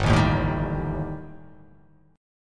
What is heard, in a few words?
G Suspended 2nd Sharp 5th G